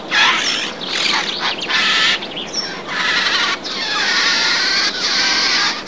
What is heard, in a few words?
Perisoreus infaustus